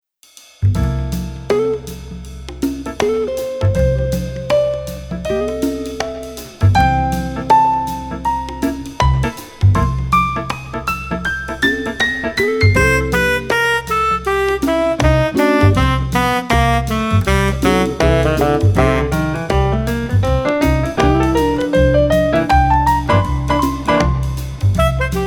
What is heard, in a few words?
Up and Down Butterfly Instrumental